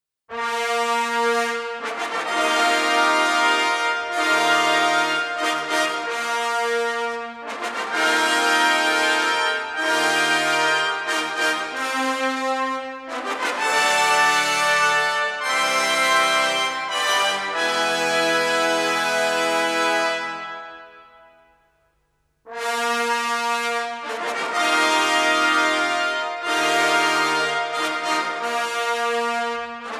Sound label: fanfare prelude